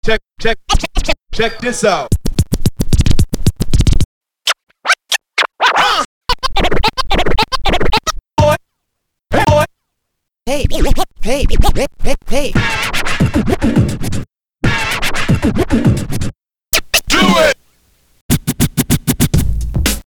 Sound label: turntable vinyl scratches